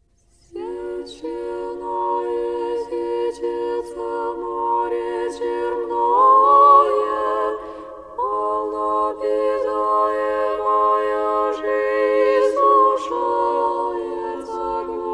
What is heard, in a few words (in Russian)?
irmos velikogo chetverga